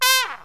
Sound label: SYNTH GENERAL; Megabass Remix! 2